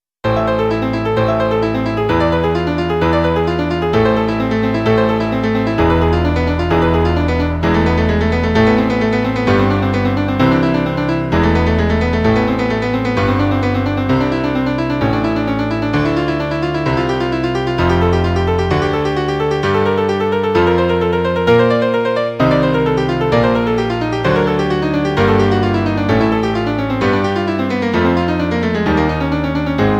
violao

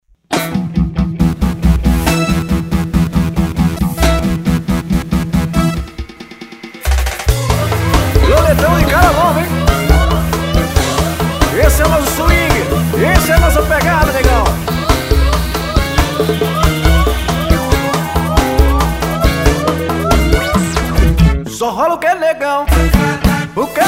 samba ensaio de verao

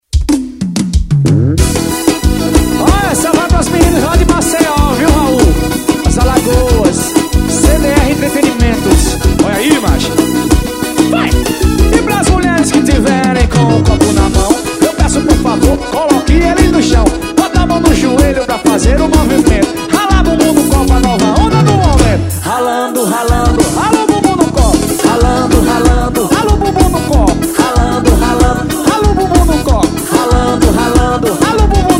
forró

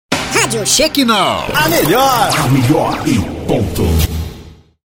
vinhetas gospel

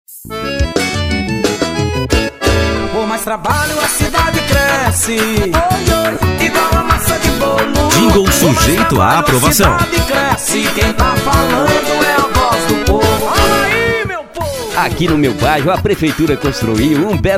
jingle politico; cidade cresse

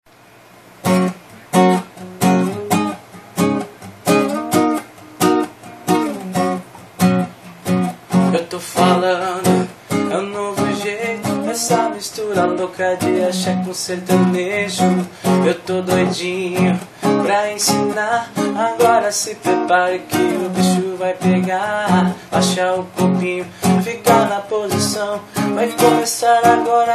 axe com sertanejo

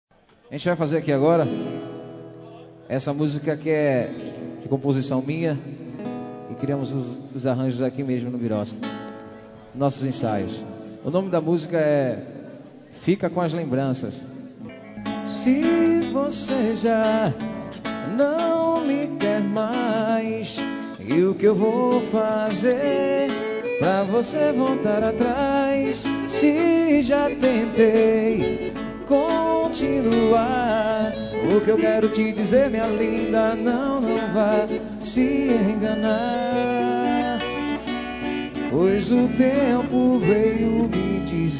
acustico

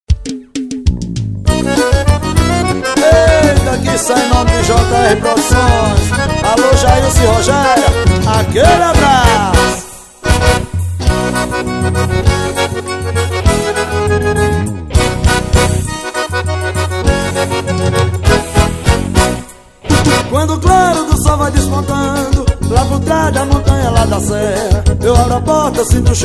forro vaquejada

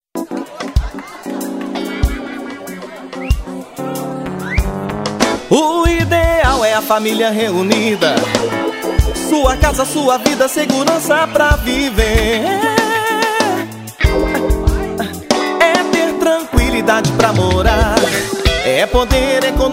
jingle roda de samba; rossi ideal

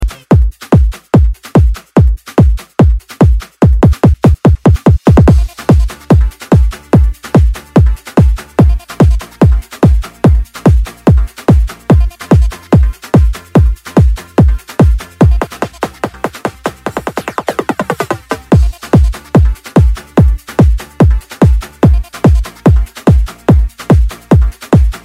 pancadao funk

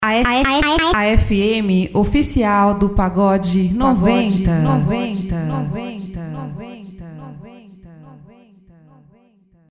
vinheta, pagode

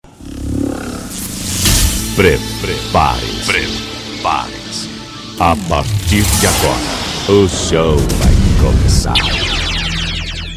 abertura

